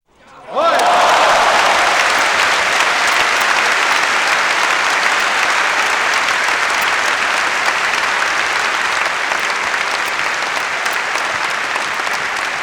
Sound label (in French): Ovation spectateurs; bruitage